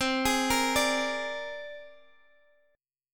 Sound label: C 7th Suspended 2nd Sharp 5th, Guitar, Open G